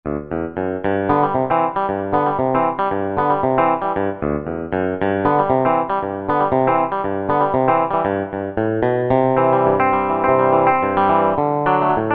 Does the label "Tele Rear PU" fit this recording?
Yes